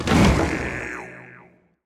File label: Rune Apply